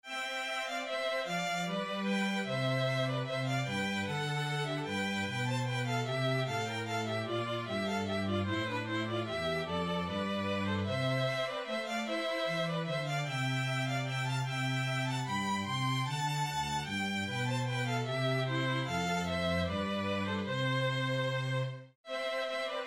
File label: Kyrie Full